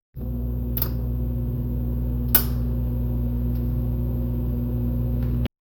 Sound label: flickering lights in store